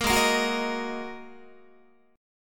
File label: Ab 7th Suspended 2nd, Guitar, 4A,4E,5A,5D,5G sharp,6C sharp; Ab7sus2 strummed; 13,16,13,16,14,14